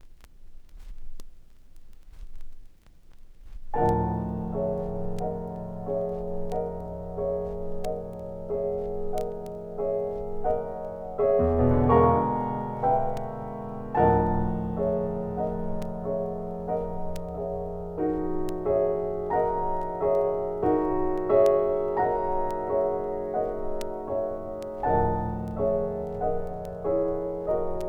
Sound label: Piano